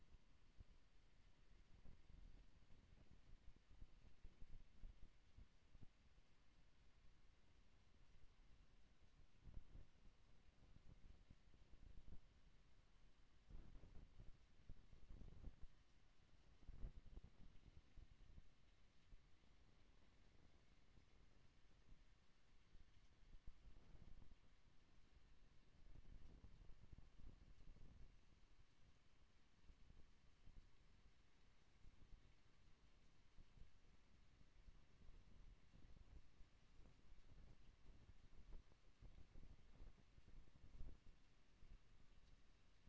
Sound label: HYDROPHONE 16000Hz; JASCO AMAR HYDROPHONE 740